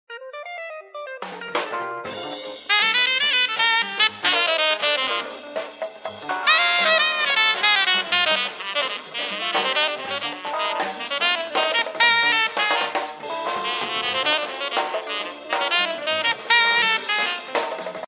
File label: Jazz bar; Samsung GT-M2310